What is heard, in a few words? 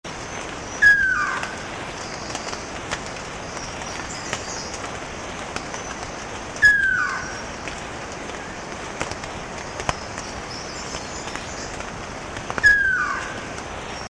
red breasted coua; Madagascar - 20th Oktober to 16th November 2003